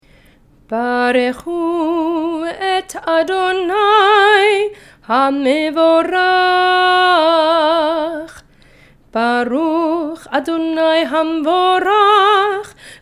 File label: Barchu